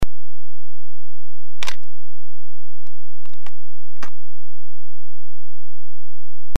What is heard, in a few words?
SRT101 125, camera body